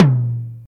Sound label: low mid tom drum single hit a sharp key